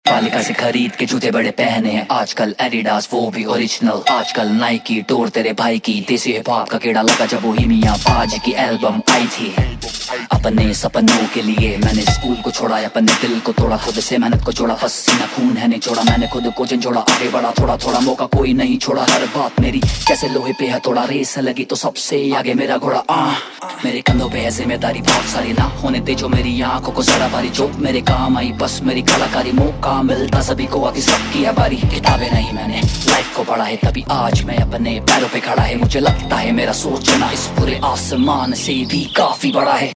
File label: Spoken Word Desi Hip-Hop Ringtone, Punjabi